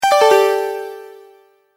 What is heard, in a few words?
Multimedia Tone-Return/Back